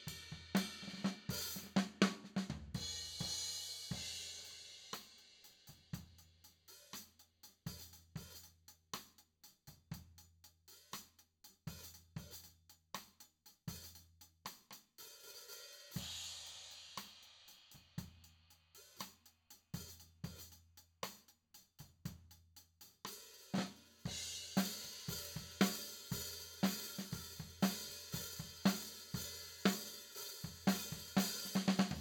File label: Tom